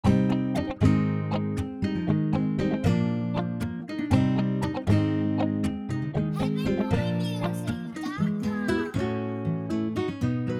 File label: RUMBA GUITARS SAMPLES